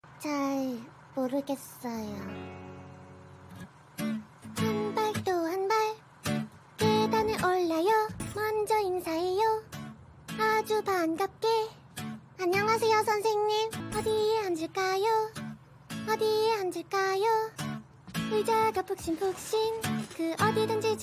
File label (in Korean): 노래 더빙 샘플 어린 여아 목소리